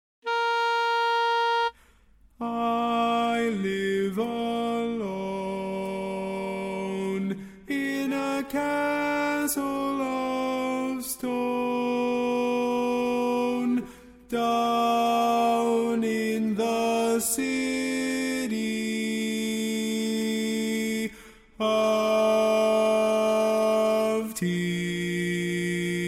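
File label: Baritone, barbershop tags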